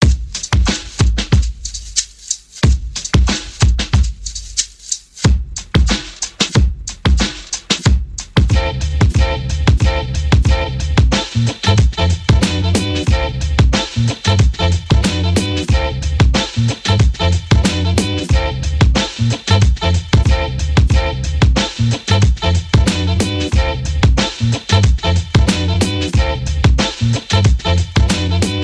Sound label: The Beat